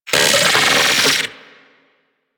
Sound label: Vocal Pain; Warframe; Corpus; Arachnoid Wraith